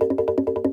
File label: RUN RUN, cartoons